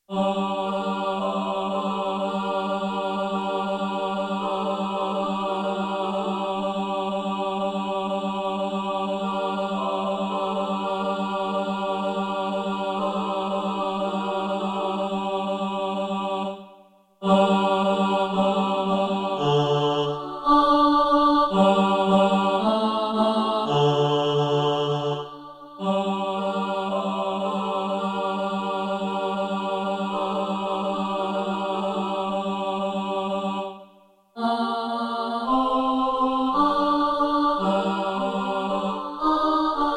bass

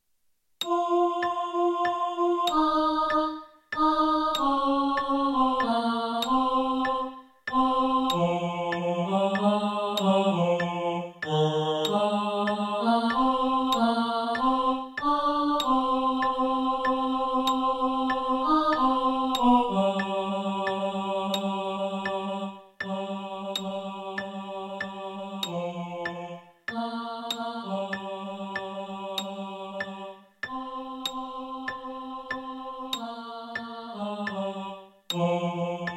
tenor

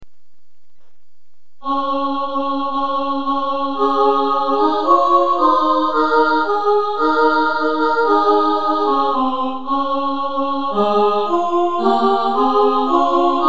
ubers gebirg sop alto, choir

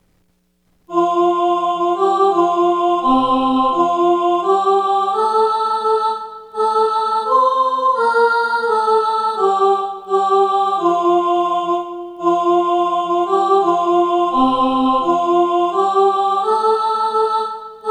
soprano, choir

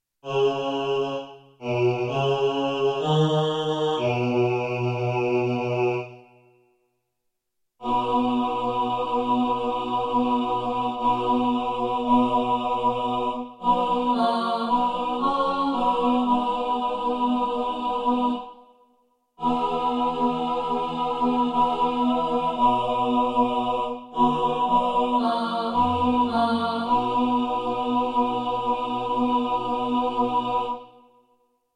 dawn tenor, choir

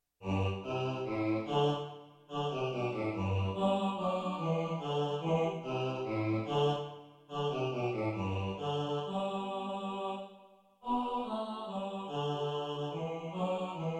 bass, choir; past three o'clock

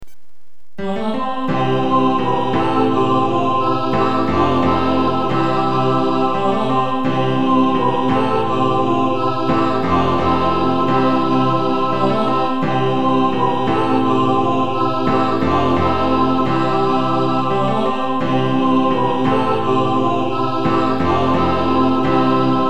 satb, choir

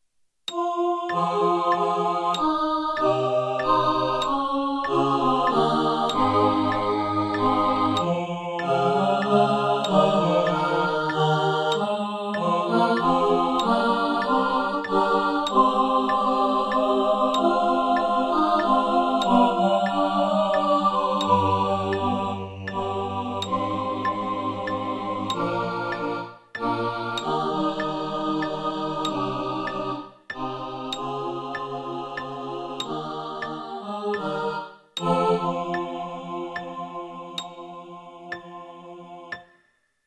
satb, choir

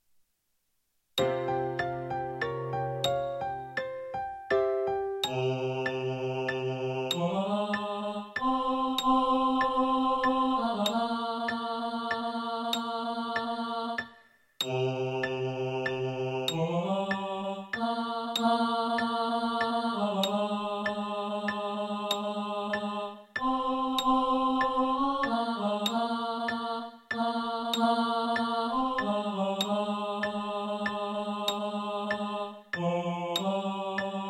bass, choir

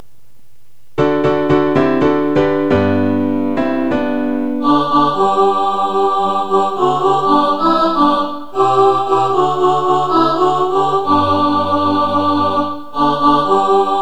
alto, choir

